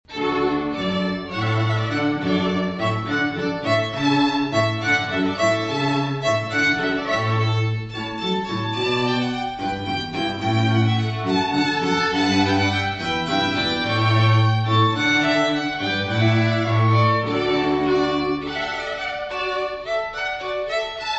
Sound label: Horn Pipe From Water Music, String Ensemble; Handel